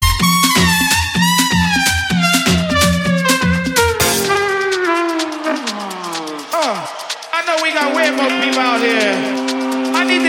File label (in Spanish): la trumpeta